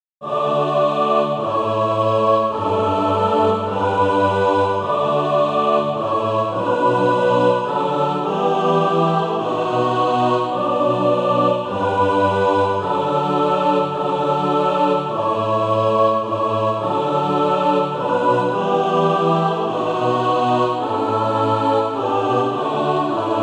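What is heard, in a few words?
mixed, SATB Practise Music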